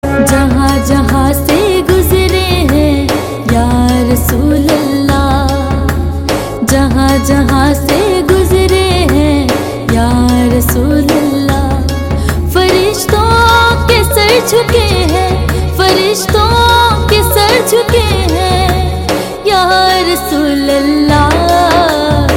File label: ya rasool allah naat